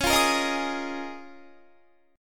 Db 6th Add 9th Db down Guitar; Db6add9; strummed

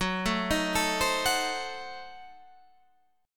F sharp Diminished 7th F sharp Guitar Standard; arpeggiated; JGuitar

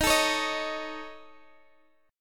A Major Flat 5th D sharp

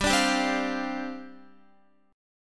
G 7th Suspended 4th G down Guitar

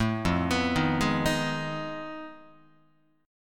F 7th Sharp 9th Flat 5th F Guitar; arpeggiated; chord; F7#9b5; JGuitar

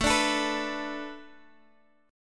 A Suspended 4th Add 9th A

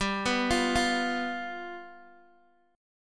G 7th G down Guitar DADGAD

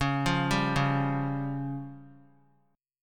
C sharp Major Flat 5th; C#Mb5 arpeggiated; JGuitar

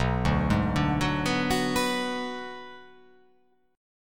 B Minor 6th B

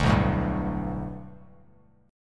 B Minor 7th Sharp 5th B Bass Standard, chord; 10,2,7,0; Bm7#5 strummed; JGuitar

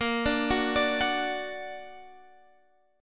Gb Augmented, Guitar

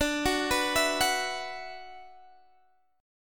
D 6th Add 9th, Guitar DADGAD

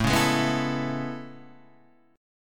A Major 9th A

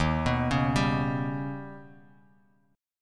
Eb Augmented 7th Eb down; Eb+7 arpeggiated; JGuitar

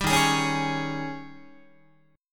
D sharp 11th D sharp, Guitar; strummed; JGuitar

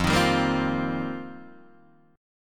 F 9th Suspended 4th F Guitar Open D, chord

